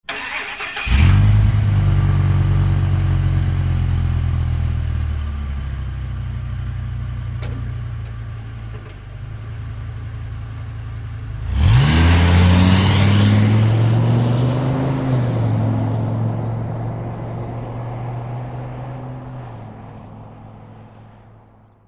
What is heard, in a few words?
Dodge Ram Start Idle